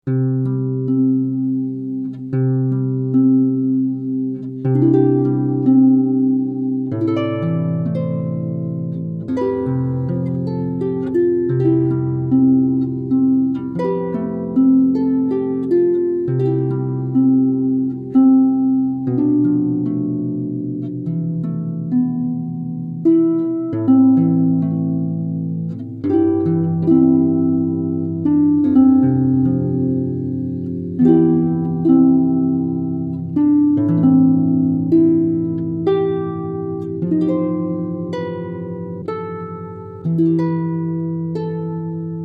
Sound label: Harp